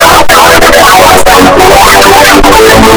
gold error

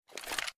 equip weapon; mm2

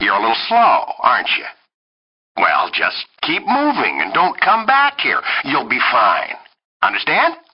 reeeeeeeeeeeeee

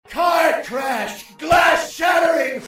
car crash glass shattering

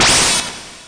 old powerup sfx; touhou

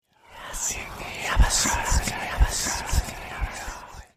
spooky whispers

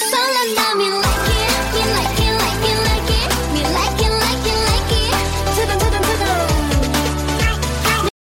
alert

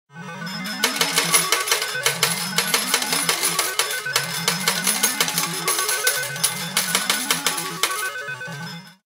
slot machine med payout

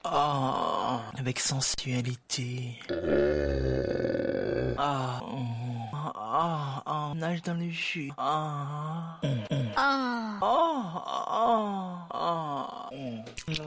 mmm

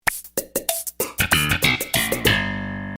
sitcom transition bass riff; seinfeld

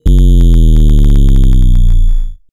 bass drop

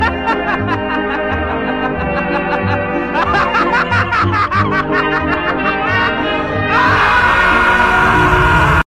laughing; darly boxman

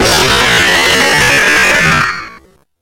jumpscare sound; night at zerty tp zssfgggh

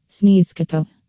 sneeze kapow

